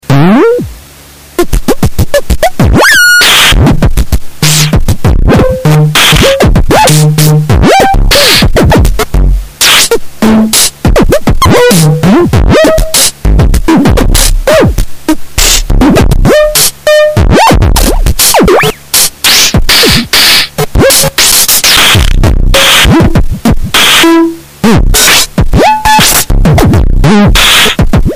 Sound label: heavily crunched